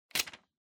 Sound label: Calendar Pull